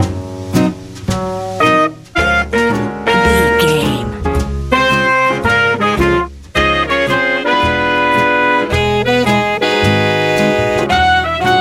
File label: easy jazz